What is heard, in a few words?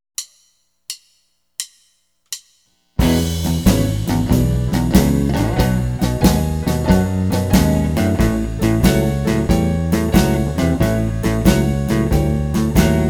Slow 8 Bar Blues in E